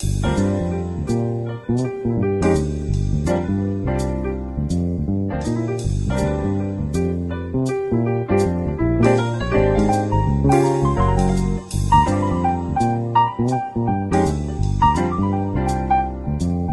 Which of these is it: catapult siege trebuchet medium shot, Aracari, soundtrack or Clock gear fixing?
soundtrack